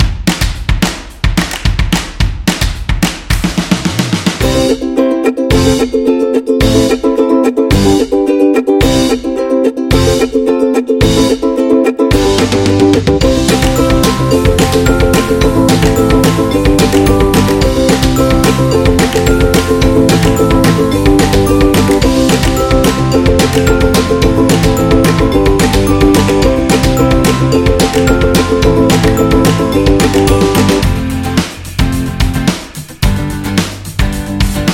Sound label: Happy Ukulele Background, happy music